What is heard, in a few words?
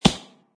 plastic grass